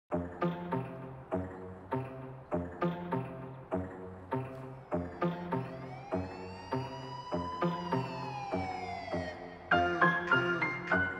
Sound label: aggressive fast flow trap rap beat instrumental dark trap beat